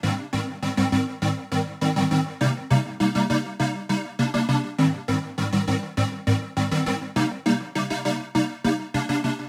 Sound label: Synth Cmin; Loops; Tonal; Ableton Live 12 Lite; Core Library